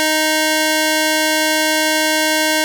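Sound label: string D#3; fleet keys Samples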